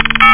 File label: BIP; Flashback Demo